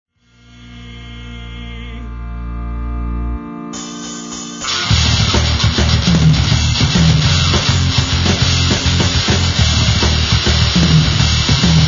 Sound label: Rhythm live